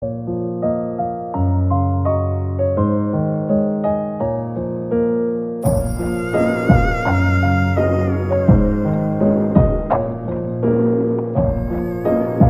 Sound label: instrumental ringtone